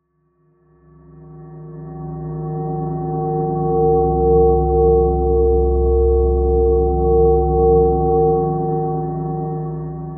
Hit, Earth & Steel

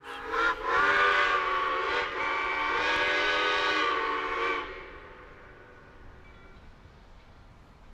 Steam Train

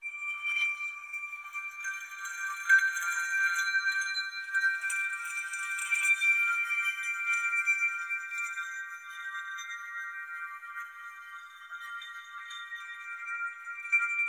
Water Chimes; Raven; Dripping Water

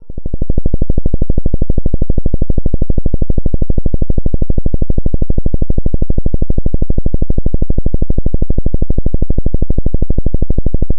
Square 6Hz

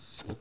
swish

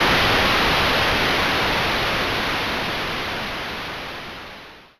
noise

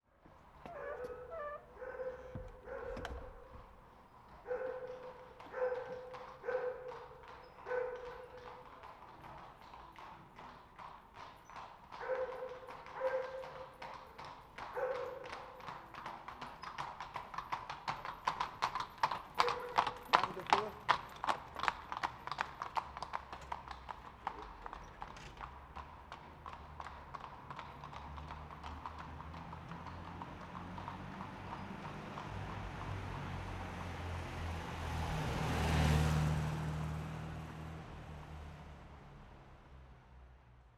Horse, Salzburg

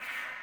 saber hit

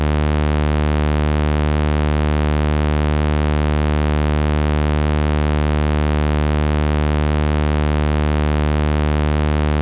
Sawtooth

